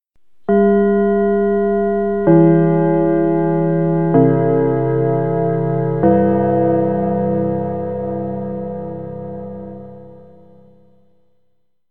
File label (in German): Schulgong Pausengong 4-Klang Gong